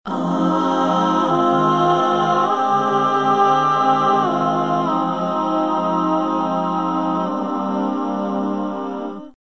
female harmony, female singing; VOCALS and SPOKEN WORD

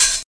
electro hat; STYLE KITS; Hip Hop; Boy In A Band Electro Kit

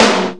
EMU SP 12, drum machines; Kult

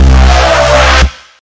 desecrated bass hit, evil bass sounds

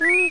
R2D2 wow; UNUSUAL KITS and FX; R2D2 Construction Kit

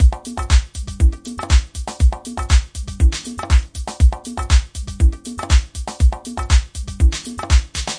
120 bpm my conga luvs drum machines

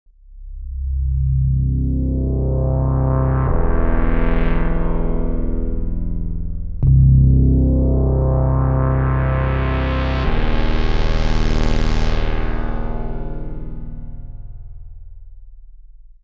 140 big scary synth sweeps; SYNTH AND ELECTRONIC LOOPS

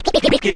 get, Scratching and Turntablism

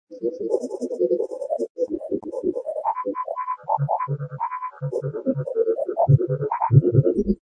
metasynth stutterbox; SYNTH AND ELECTRONIC LOOPS

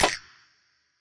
clock; Hip Hop Specialty Kit; style kits

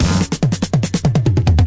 140 bpm disco drumroll intro

